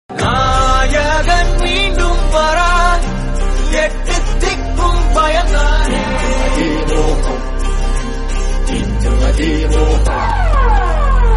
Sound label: song, tamil; vikram